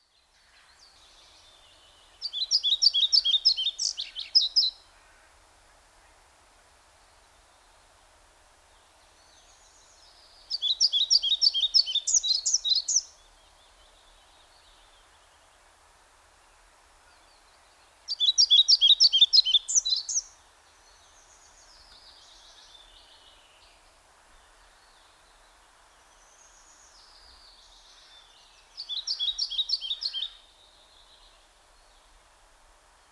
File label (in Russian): Мухоловка пеструшка Ficedula hypoleuca